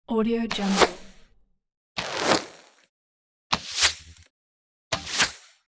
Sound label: longbow fires various arrows